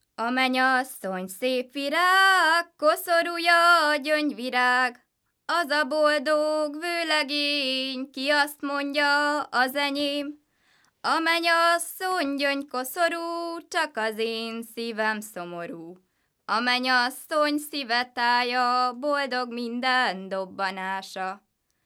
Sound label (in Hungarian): nepdal; a menyasszony szép virág